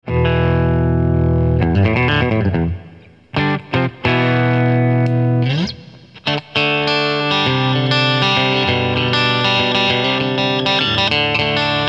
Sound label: Clean, Cyber Deluxe